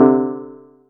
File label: Chord; ModelCycles